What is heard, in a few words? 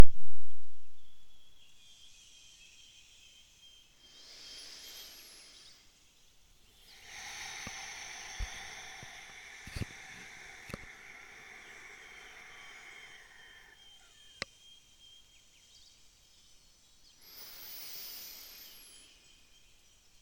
waves